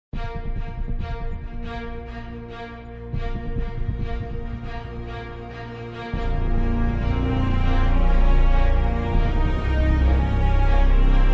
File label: No Vocals, Epic Action & Adventure